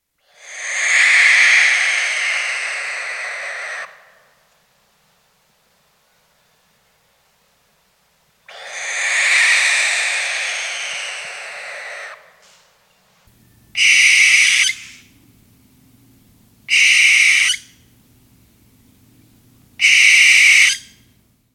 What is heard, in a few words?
barn owl